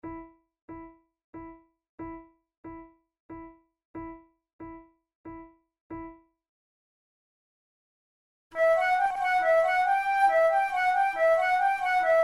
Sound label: gigue, flute